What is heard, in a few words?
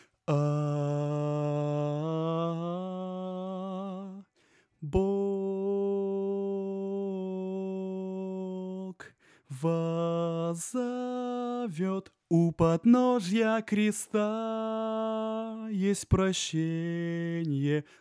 alto